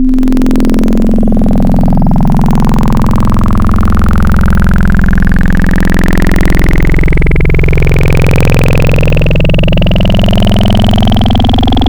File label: Sine Bend I, Wavetables; Cymatics; Wavetables Collection Vol 3; Serum